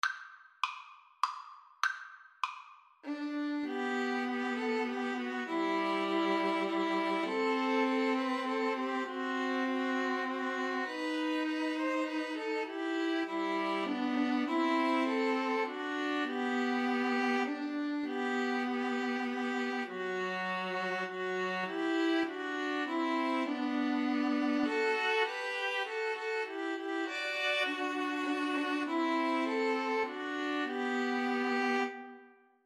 string trio

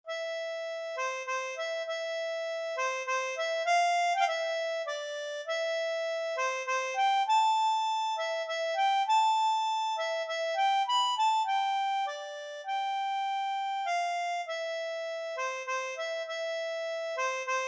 waltz, harmonica; brahms

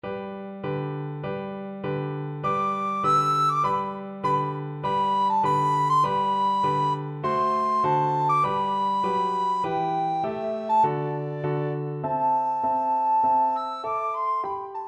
recorder

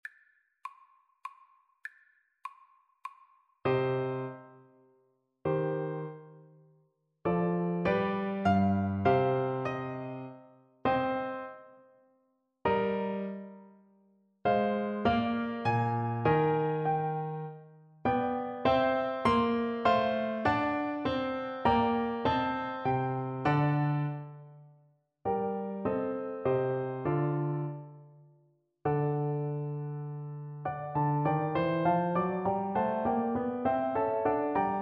horn, French Horn, sonata

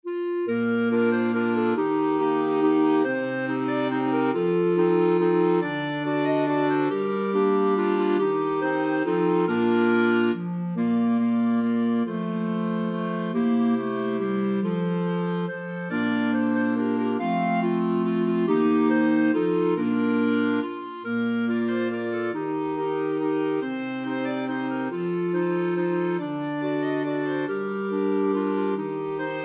clarinet choir

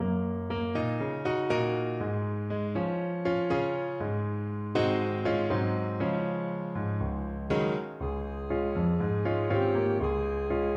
saxophone

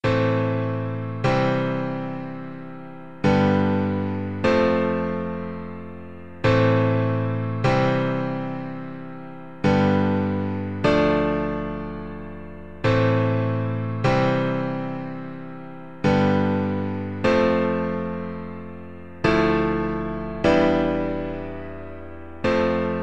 guitar

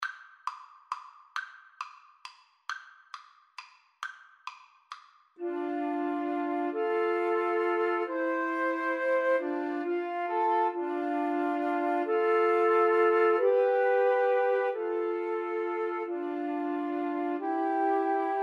Flute Trio

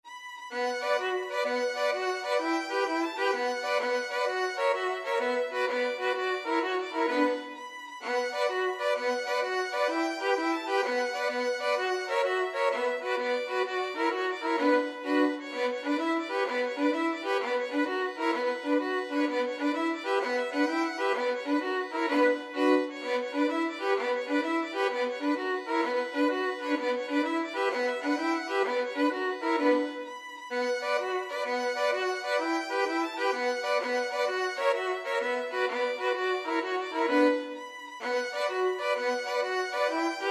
tarantella, violin quartet

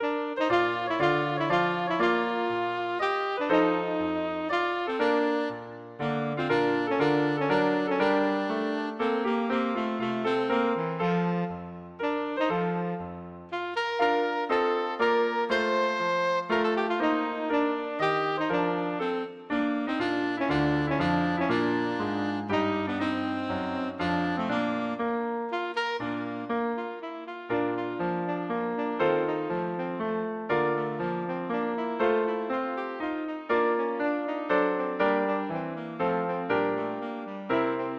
minuet g, saxophone

